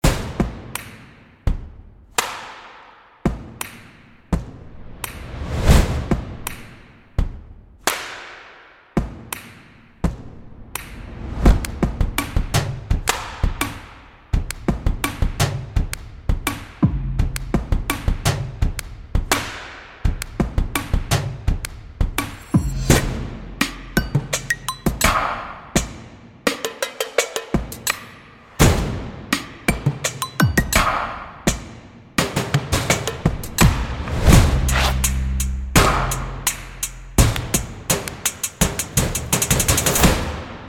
Drums That Go Boom

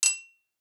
PERC

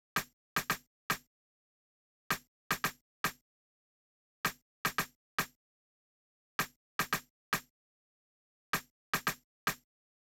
112 bpm Am Snare

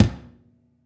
PERC

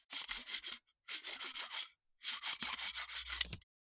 stick rubs

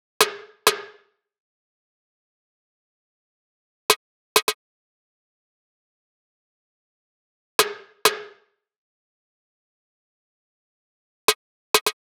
drop offbeatsnare loop 130

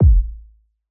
Kick Sample; Trust Nobody

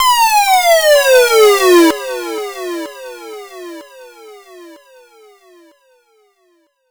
Fx Sweep

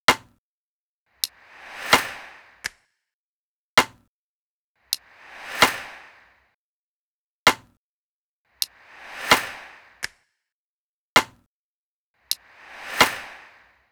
Drum Beat 130bpm; Lightning; Zenhiser

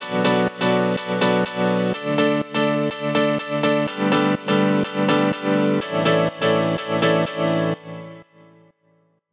Piano, 124, B

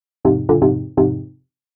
Piano Loop 124 Fm; JackItUp

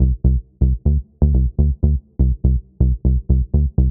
123 C Synth Bass

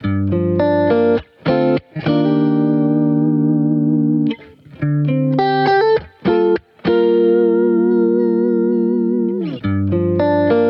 100, electric guitar loop, Gm; unique

